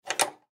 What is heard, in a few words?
Coat Rack Remove, Coat Rack Remove Hanger; Household; Dressing; Sound Effects:Human